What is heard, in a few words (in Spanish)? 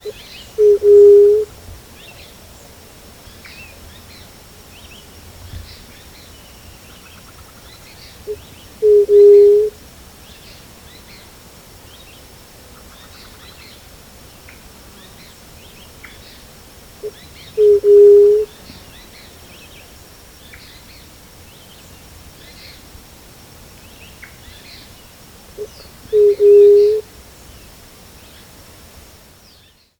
caminera rabiblanca leptotila verreauxi